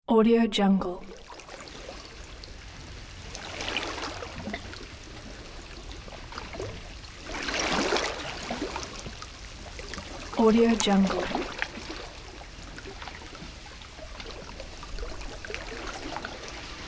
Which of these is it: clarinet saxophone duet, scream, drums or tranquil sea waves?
tranquil sea waves